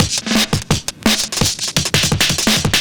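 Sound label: Drum and Bass Beat 170, drumnbass 170bpm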